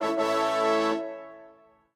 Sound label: Goal Reached; virtual city windows gamerip 2009